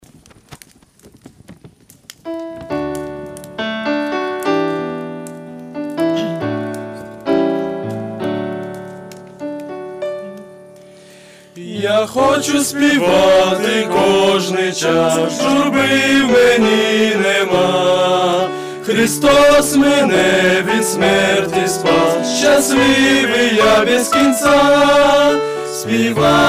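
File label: penie na ukrainskom yazike, singing in Ukrainian; Молодежный лагерь - Апшеронск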